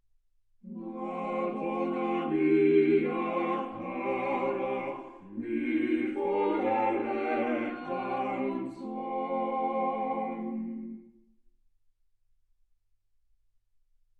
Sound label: quartet